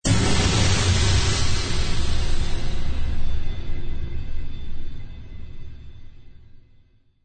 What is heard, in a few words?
Epic Movie Trailer Aggressive Cymbal Crash